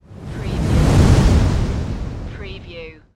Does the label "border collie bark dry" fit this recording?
No